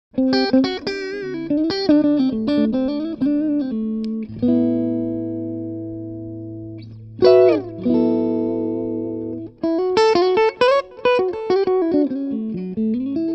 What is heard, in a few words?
Clean full Volume